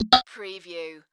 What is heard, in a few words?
Multimedia Percussion